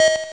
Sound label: Collect; Classic800XL